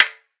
armor

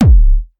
Hardbass Kick

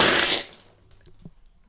Spitting Out Water

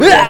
Awful Shout Man

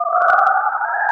Space Sound

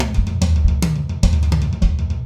Tribal Beat

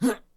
Male Hurt

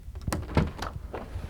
Window Open Double Glazed

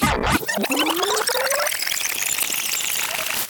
Turntable Rewind

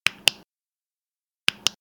Clic Sound Sonido Clic

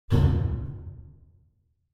Deep Metal Impact

